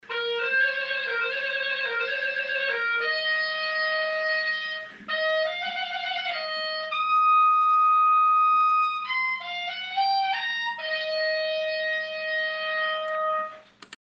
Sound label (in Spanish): clarinete